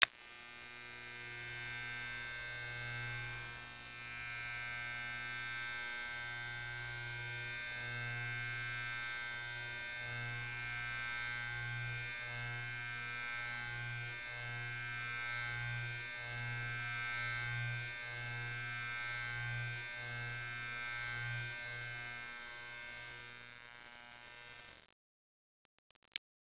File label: clipper; Corel CD Power Pak: Sound Clips